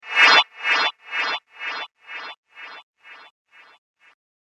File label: Sucking Up The Future; science fiction sound effect; Andrew Fleming